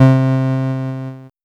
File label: Casio VL1 Piano; Solo-Synths; Giga Samples Collection